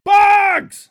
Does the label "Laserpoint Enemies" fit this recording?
Yes